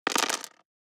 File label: DICE on Plastic, Throw and Roll, Standard, 6 Six Dice; Samples; ArticulatedSounds; DICE Sfx Party (Extended)